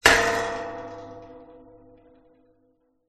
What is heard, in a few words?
impact metal gate large farm hit post